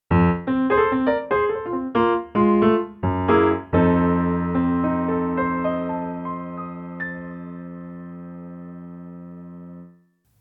Blues Ending Key of F

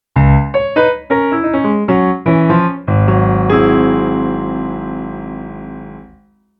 Blues Turnaround Key of Db

